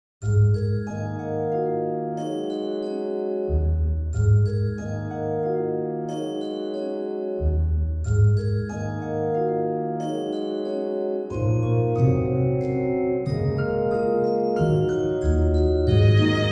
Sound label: Bgm Ambience; Alchemy Garden; Windows